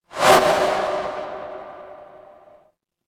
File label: transition swoosh